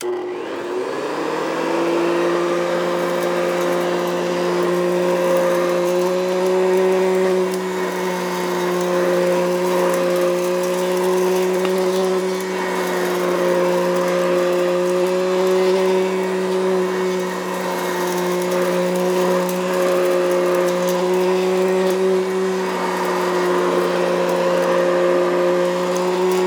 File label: sound of a vacuum cleaner